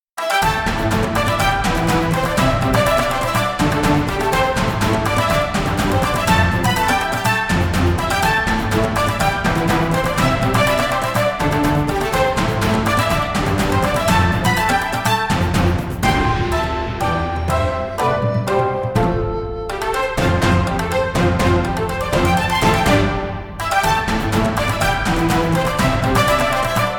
Victory Song; Shadow Bug

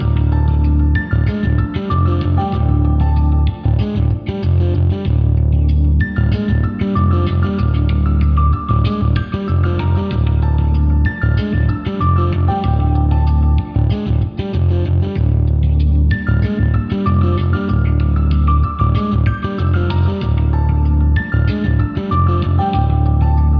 Boss Conversation Loop; Get in the Car, Loser

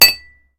Impact glass hard

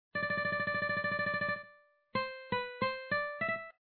Melody

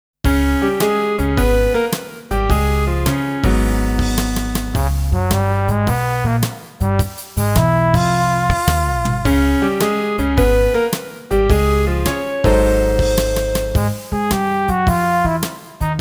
Suspicious Atmosphere

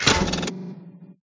Mechanicals big

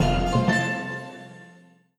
Win Sound; Plunderers; Switch Windows

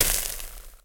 Liquid Burnt; Puddle Plus

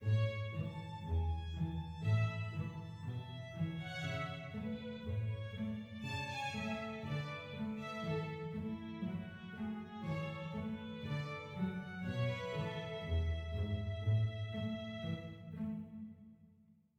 happy soft

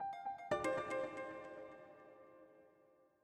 Deep Hole Dive Outro

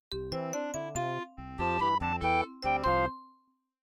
MIDI Animation stings; Carmen Sandiego Junior Detective Edition

